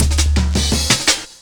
Roll On Roll, Jungle Breaks; M8

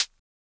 closed HH, Univox SR-95

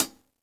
hihat - closed; JazzFunkKit

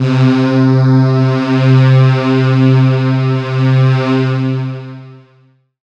pad, Dance Synths; Best Service ProSamples vol.37 - Dance Synths

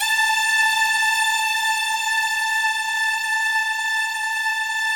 BIG ORCHESTR, A4; Yamaha VP-1; Music Store; 90's Sample CDs

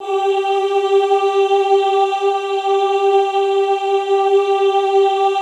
OOH G3, Classical Choir; Best Service; Extended Classical Choir; OOH MIX

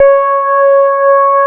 DX SYN SOLO

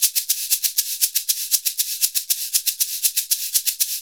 SHK, Shaker; Univers Sons; Basicussions

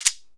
SHEKE, Afro Shakers, Shekeres

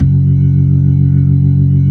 B-3, Jazz

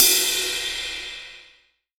JAZY CY, MONO RIDE

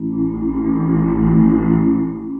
Undertone Chant, Vocal; Sonic Foundry (Sony Creative Software); Myths of Technology

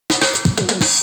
Fills 128BPM, Clubsounds

